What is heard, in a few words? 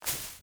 foliage jump; teardown ps5 windows xbox series xs gamerip 2022